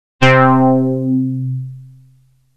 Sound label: Moogli-Bass C